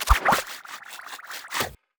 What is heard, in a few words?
emote laugh; LoL; ziggs madscientist